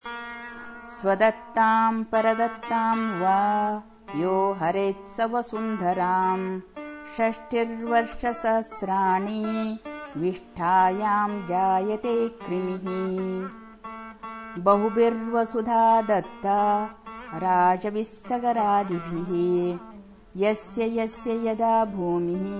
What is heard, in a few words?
Sloka; Kalamukha; Haralahalli